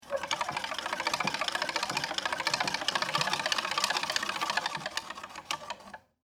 Wood Machine Spin, Foley, Wood, Machine, Drum, Spin, Wood, Clicks; Machinery; BlastWave